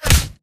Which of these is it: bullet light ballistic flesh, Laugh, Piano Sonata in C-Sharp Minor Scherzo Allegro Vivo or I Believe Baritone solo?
bullet light ballistic flesh